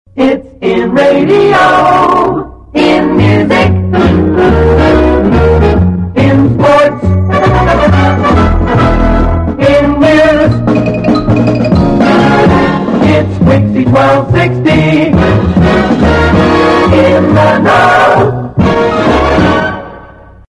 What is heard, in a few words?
Big Band; WIXY